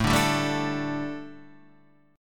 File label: A 7th Suspended 4th, chord